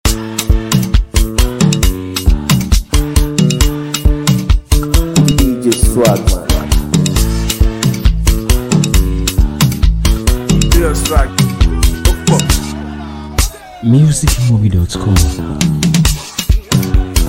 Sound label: no turning back guitar version, beat